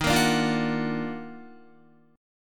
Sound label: Eb Minor Major 9th Eb down Guitar, chord; JGuitar